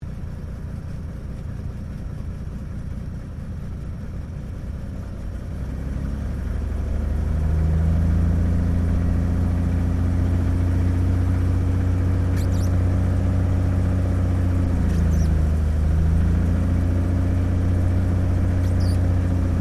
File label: AIRCRAFT CT4A TAXI INTERIOR; CT4 military trainer aircraft; Airplanes; Vehicles